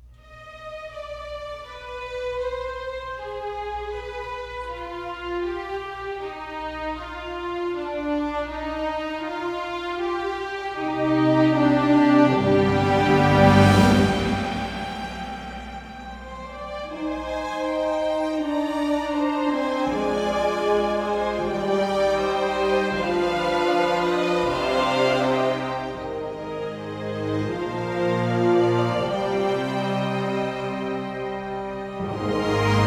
no choir; arathihighlands; Heroes of the Storm

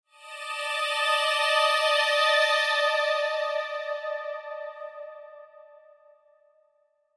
magic swell tonal dissonant high

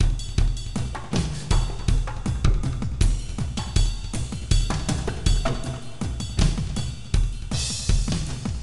sounds music; Halo Combat Evolved; Xbox

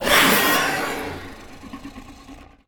Raptor hurt

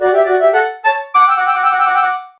Jingles Inn

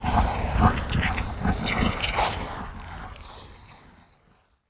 lava burst; Level4 Hidden World

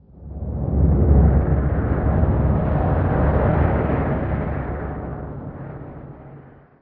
Ambient; unreal development kit

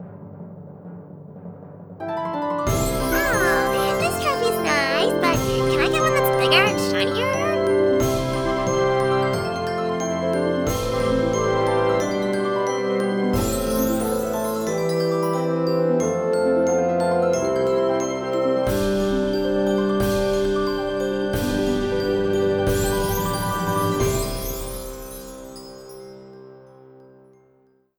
Trophy Glocks; Shrek Smash n' Crash Racing; PS2

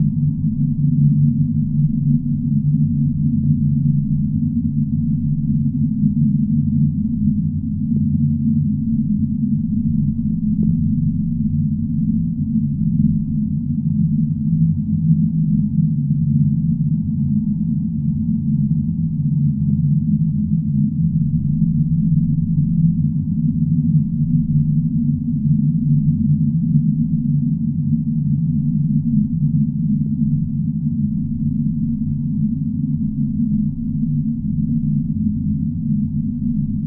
Atmo Hub

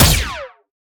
Sciweap Shot Small Laser Punchy Needler

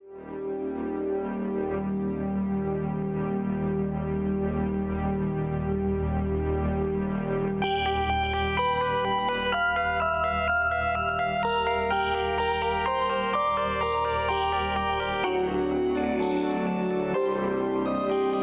Music Theme

